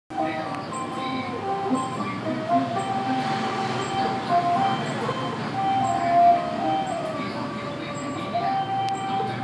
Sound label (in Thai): บรรยากาศ, วัดภูมินทร์